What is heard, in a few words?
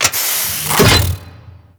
Iris Door Close B; Ambience; Gameplay; Warframe; Corpus Railjack; Comet Shredder